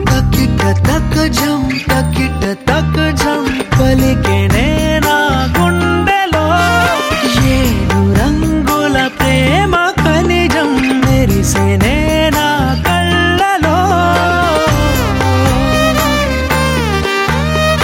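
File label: Voice with BG Tone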